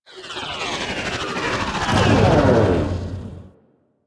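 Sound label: Ship Generator Start Stop; Warframe; Corpus Ship Remaster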